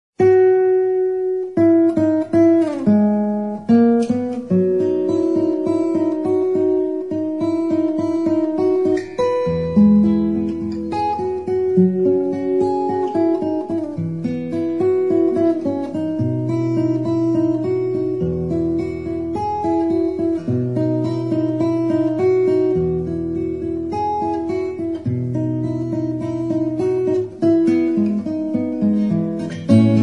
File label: solo Acoustic